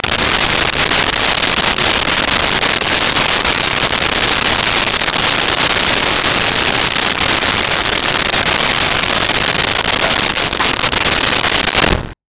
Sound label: Garage Door Open; Automobiles; 1001 Sound Effects